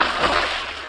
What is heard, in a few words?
water walk; Mount&Blade Warband